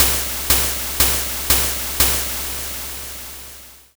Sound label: cymbal